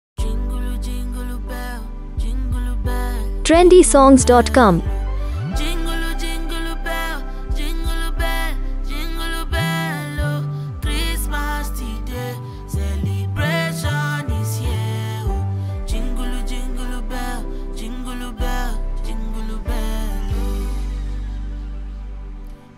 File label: Jingle Bells, Nigerian female singer